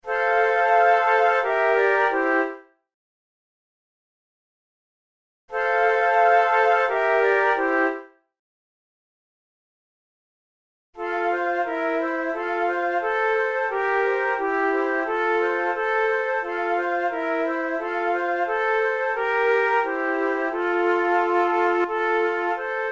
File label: trio